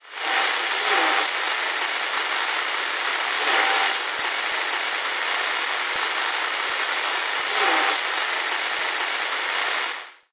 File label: radio noize; STALZONE